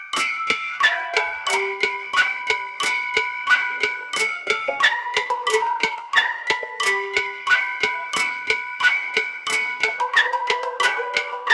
fast paced quirky fantasy, loopable

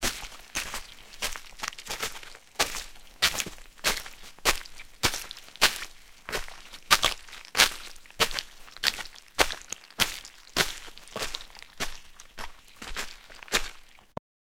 step sound in wet ground

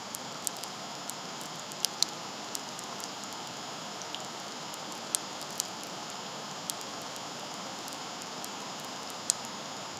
bunch of spring

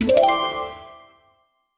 funky chime for level com, completion

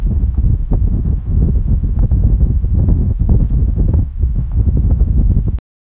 wind blowing

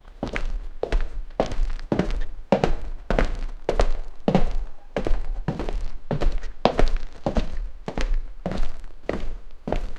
footsteps on a parquet, interior

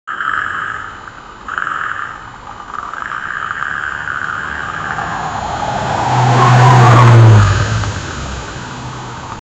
ferrari sound; sportscar

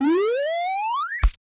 sound for player jump, platformer

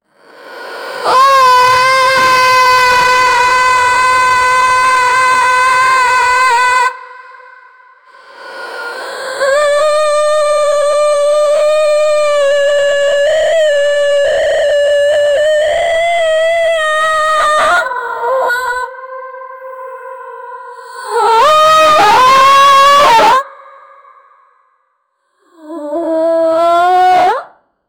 mechanical cybernetic fem, cybernetic

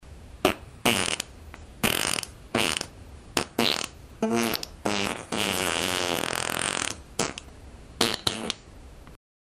diarrhea toilet farts

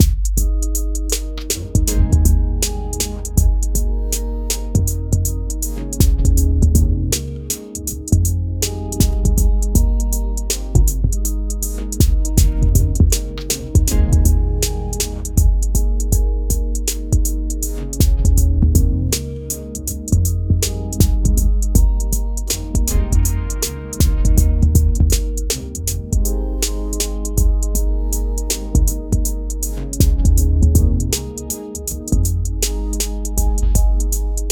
groovy hip hop loop

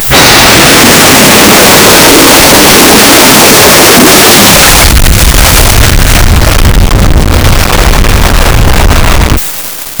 a flight crashing into; calamity

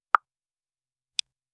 generate a clean satisfyi, ui sounds

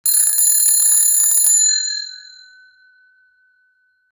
bicycle bell ringing

